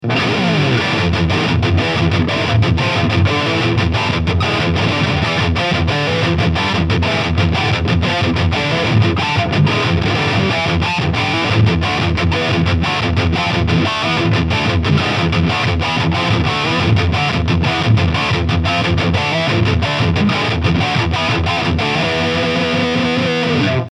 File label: Dean Exile 6 String Multiscale Kahler Burled Maple High Gain